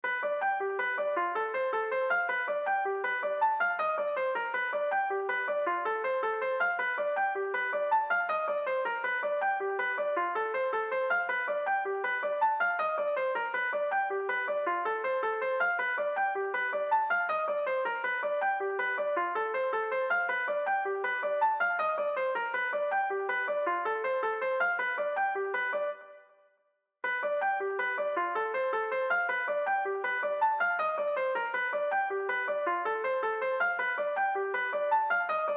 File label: piano, music; action RPG game